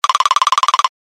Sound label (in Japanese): ガタガタ震える